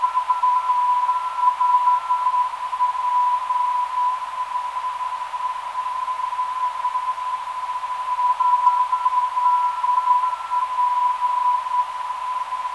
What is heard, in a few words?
351kHz, ndb